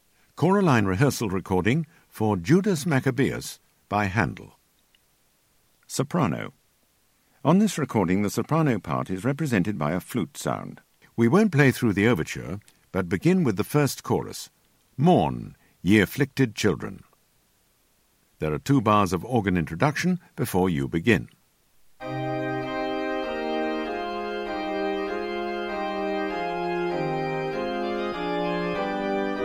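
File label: Sop, soprano; Handel Judas Macc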